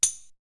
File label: Tambourine; ZERO G - Datafile DISC 1